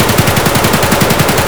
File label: ak74m indoor distant loop; Escape from Tarkov